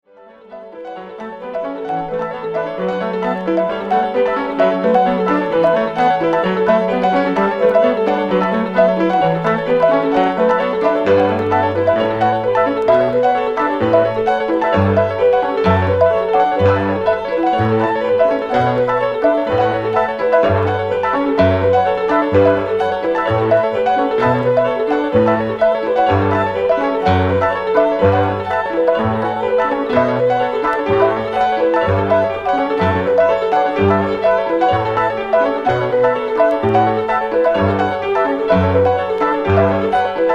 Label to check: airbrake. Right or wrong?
Wrong